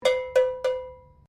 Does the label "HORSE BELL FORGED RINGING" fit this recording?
Yes